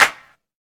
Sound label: clap single shot d sharp key; single hits one shots